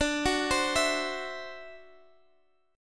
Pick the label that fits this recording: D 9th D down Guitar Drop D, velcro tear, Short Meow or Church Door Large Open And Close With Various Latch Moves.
D 9th D down Guitar Drop D